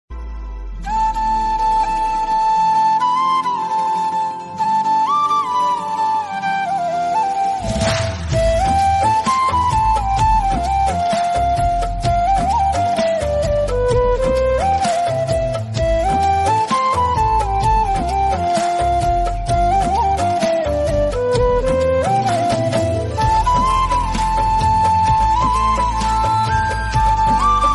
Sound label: flute version ringtone, bansuri ringtone